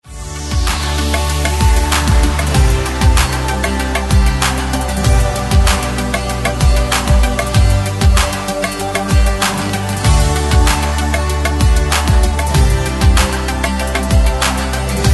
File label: karaoke; disney